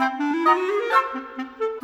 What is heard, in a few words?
Winds, Orchestral